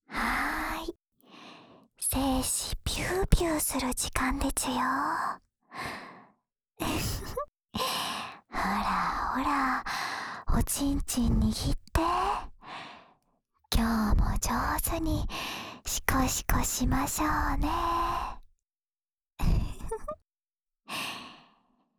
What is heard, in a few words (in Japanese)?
オナサポ用耳舐めループ（右耳, ASMR